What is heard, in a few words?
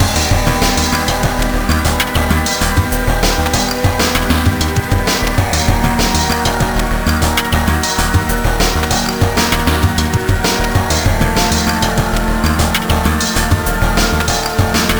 Boss Loop; high strangeness; wii u